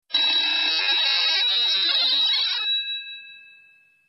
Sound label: Warped Ring two